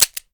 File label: charge in trigger pull; gmod